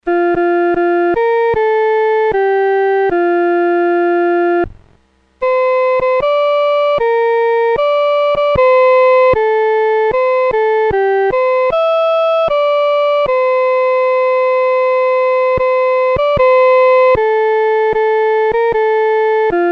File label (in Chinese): 独奏 第一声